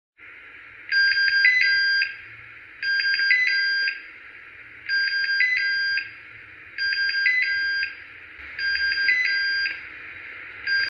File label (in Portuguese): toque ringtone; diabo veste prada